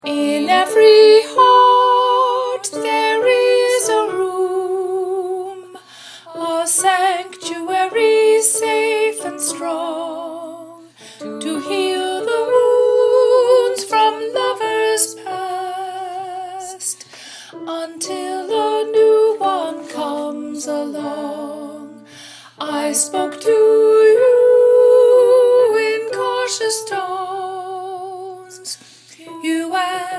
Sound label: soprano audition track